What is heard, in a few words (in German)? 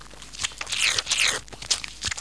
Sound Pott